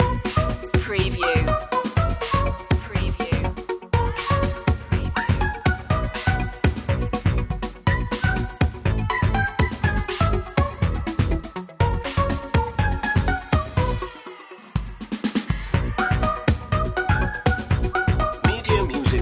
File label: bubbly loop